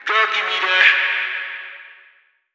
phrase F#m, Vocal

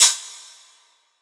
hat, Open Hat, Drum One Shots; dragon; SD MIDNIGHT Sample Pack; Slate

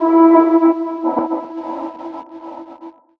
vocal glitch Em; SD AURORA Sample Pack; Slate

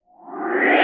riseup, fx; Pop; Drums; Slate; SD PASADENA Pop Sample Pack

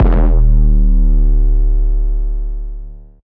808 Bass Big N Wide Eb3; Slate 808 Deep Dive; SD Signature 808s

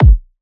kick brup; Drum Sample Pack; One Shots; Slate; SEISMIC

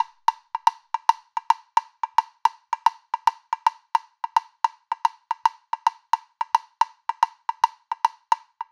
woodblock loop 110; perc construction loops; SEISMIC Drum Sample Pack; Slate

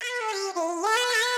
phrase Em, Vocal; Slate Digital Omnivox Vocal Sample Pack

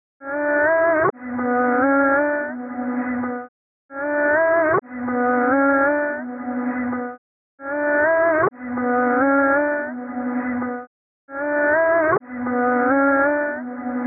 Vocal chop, 130 Bm; Beast; Slate Digital Omnivox Vocal Sample Pack; Chops

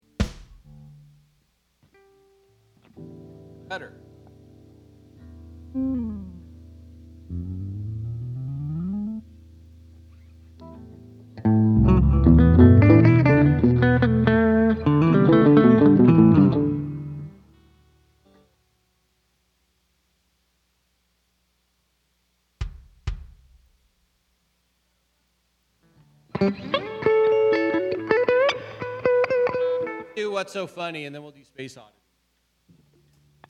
Strings Emphasis

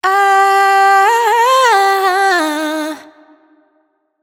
riff Ah Huh Run Cm wet, vocal; Slate Digital Omnivox Vocal Sample Pack

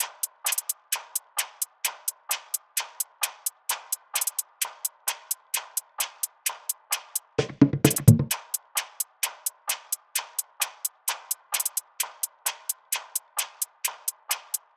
hats 130, Hat Loops; drum sample pack; Bagana; SEISMIC; Slate

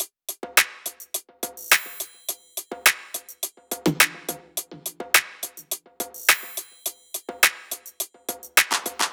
top loop, Drums, Loops, Top Loops, Pop; greenhill 105; Slate; SD PASADENA Pop Sample Pack

